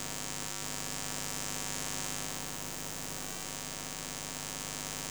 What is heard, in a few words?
Electromagnetic Playstation Kit; Mono; Essentials Series NOX SOUND